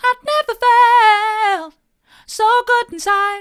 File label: mid, vocal